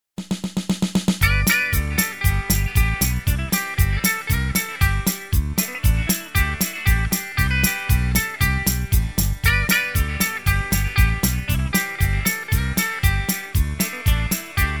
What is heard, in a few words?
Rockin' Country; Soundzabound Volume 1